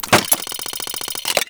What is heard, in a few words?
steampunk mechanical gear chain element start stop; Bluezone Corporation